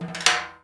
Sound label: TIMB ROLL; Greytsounds VOL-1; SDX PERC